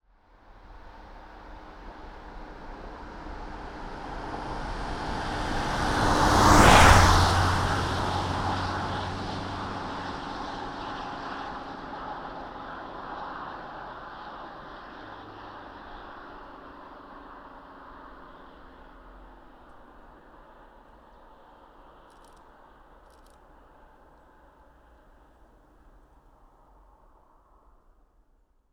Renault Master 2.8dTi Exterior Passby Medium Speed; Renault Master II 2.8dTi; Stereo M10; SoundHolder